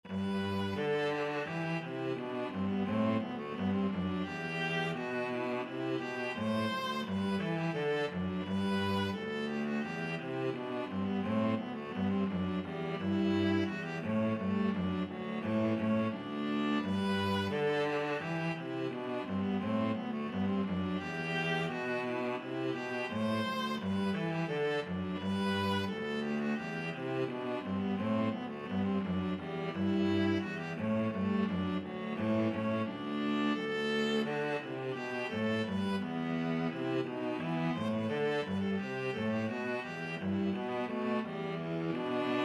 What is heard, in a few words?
hornpipe, viola cello duet